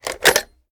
reload fast mag out

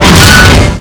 heavy crash, vehicles

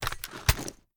reload drum end; reloads; gmod; iw8

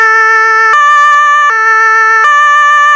german police, siren; gmod; svmod

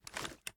reload empty start, reloads; gmod

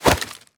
melee attack gun; viper

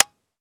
akilo47 disconnector; weapons; viper

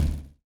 stop, vehicles

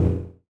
stop, vehicles

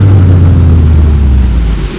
stop, vehicles

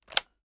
selector off, weapons; gmod; viper; scharlie

